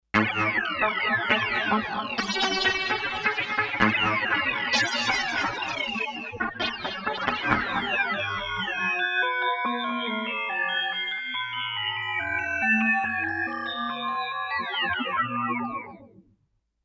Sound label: Meltonin, Kawai K5000S factory presets